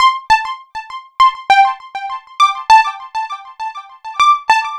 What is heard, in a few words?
Synth, Trip-Hop Loops; Sonic Foundry (Sony Creative Software) - Rondo Brothers Trip-Hop Loops & Samples; El Corazon